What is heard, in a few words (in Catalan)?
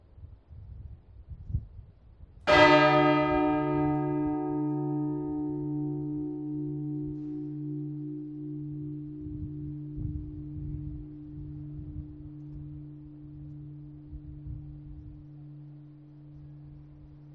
campana